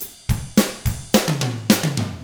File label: POP ROCK, Drums; ILIO; Double Platinum Drums 1